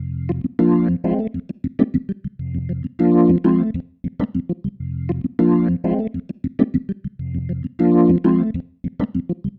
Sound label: Bubblin, Organ; Sonic Foundry (Sony Creative Software); Joe Vitale Organ Donor